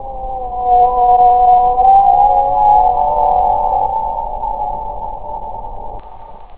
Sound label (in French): pleure, baleines